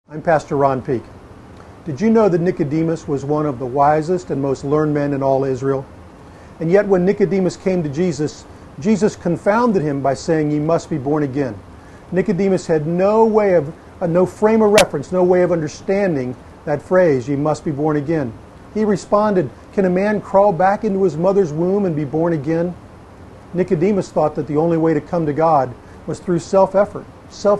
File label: gospel lofi